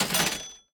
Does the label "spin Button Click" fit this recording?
Yes